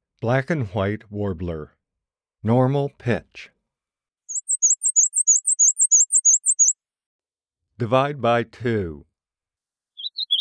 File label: Black and white Warbler